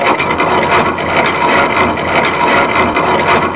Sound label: big chain; dm clocktower